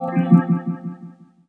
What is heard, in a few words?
Invite